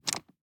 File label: DICE on Neoprene, Grab, Standard, 5 Five Dice; Samples; ArticulatedSounds; DICE Sfx Party (Extended)